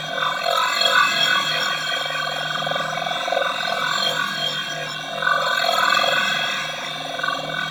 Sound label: Light Loop; Warframe; Warframes; Harlequin